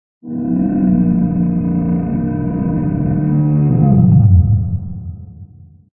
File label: Growl